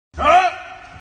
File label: gym roar ringtone